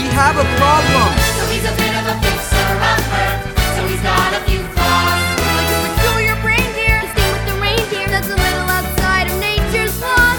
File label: audition vocals